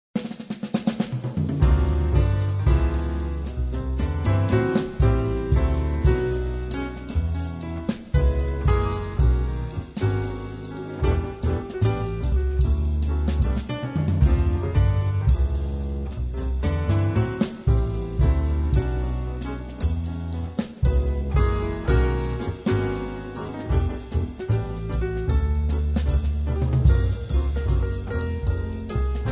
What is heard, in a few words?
Jazz